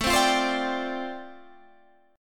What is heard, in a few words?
G 7th Suspended 2nd Suspended 4th A